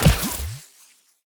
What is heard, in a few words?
tower demon Pit basic Attack